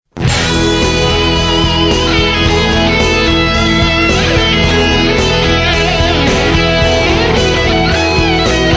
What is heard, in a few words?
Solo Rock